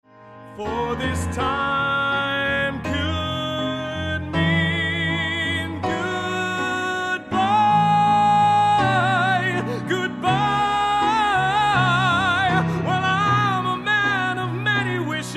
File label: male pop